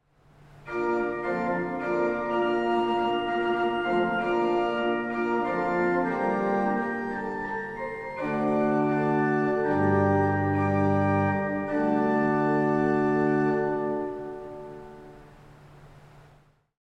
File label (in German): Orgel, pfeifenorgeln; SW-Holzprinzipal 8'+Gemshorn 4'+Gemshorn 2'